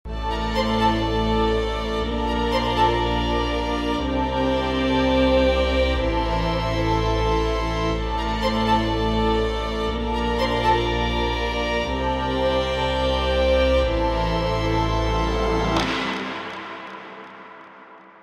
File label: solo pop piano instrumental